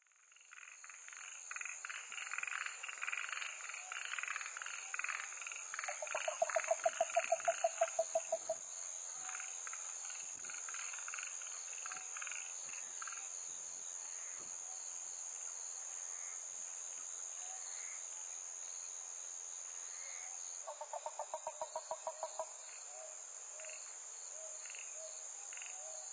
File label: yaeyama harabuti gaeru